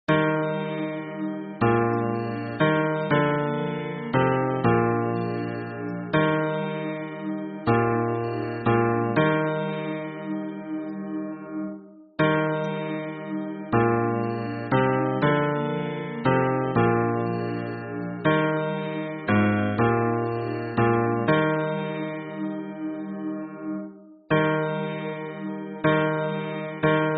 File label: hymn bass